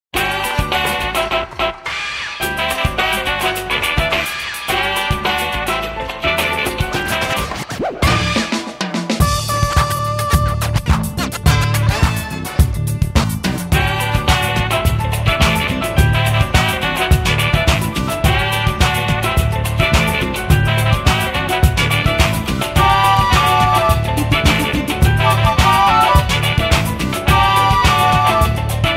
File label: San Juanito swing